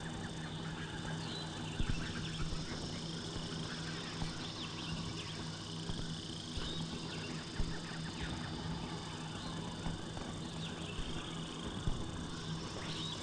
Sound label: Ambiance Jungle; Iron Man 2 iOS gamerip